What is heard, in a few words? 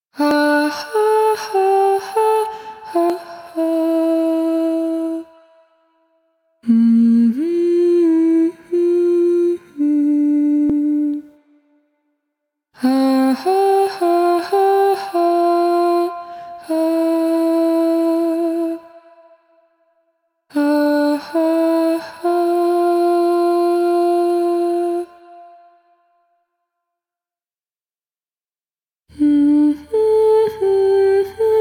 Intro Cue Vocals, Music; Dynamic Music; 1999; Lotus Eater